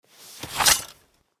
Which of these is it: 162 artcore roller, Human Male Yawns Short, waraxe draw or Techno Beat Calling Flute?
waraxe draw